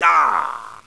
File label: DIE; Caesar III